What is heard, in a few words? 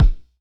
Kick The Point, Jomox Kicks; Airwindows